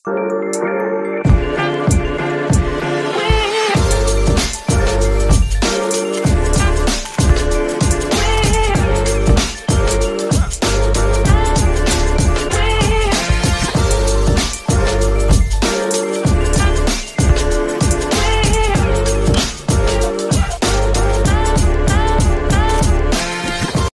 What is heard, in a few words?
ringtone; oppo a92s